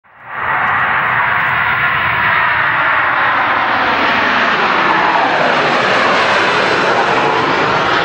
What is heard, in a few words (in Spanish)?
sonido de avion despegando